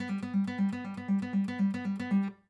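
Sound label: acoustic pattern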